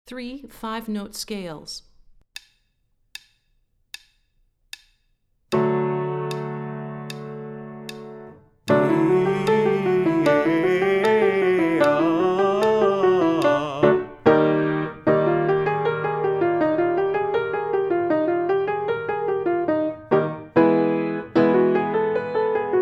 Three Five Note Scales High, warmups

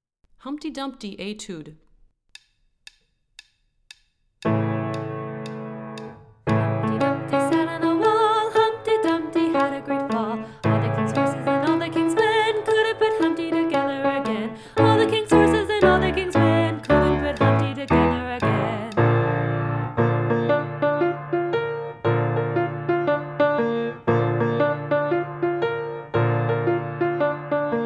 Humpty Dumpty Etude Low, warmups

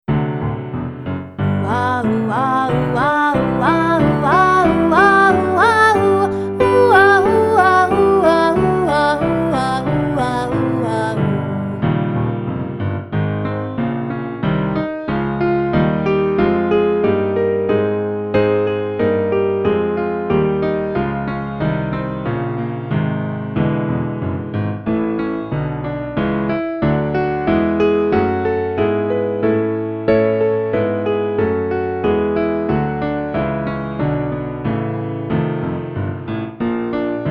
Vocal Warm Ups, Vowels; Vocal Warm Ups Volume 2

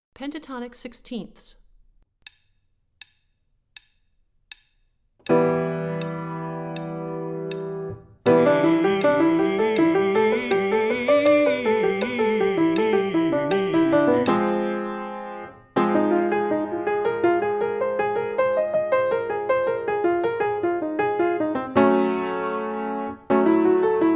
Pentatonic Sixteenths High

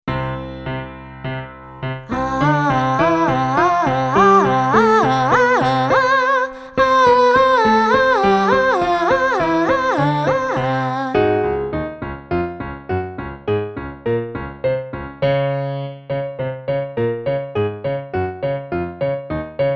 Vocal Warm Ups Increasing Intervals; Vocal Warm Ups Volume 2

